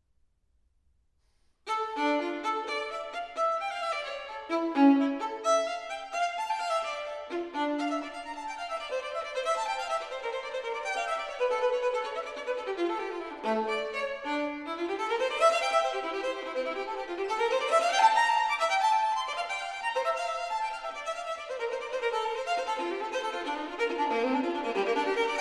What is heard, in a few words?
bach solo sarabande; Bach Sarabande BWV 1004; Bach Sonatas & Partitas; Violine